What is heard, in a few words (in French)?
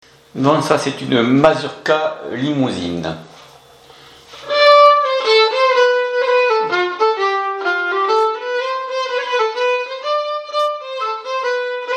Mazurka limousine violon; Répertoire collectif 21 22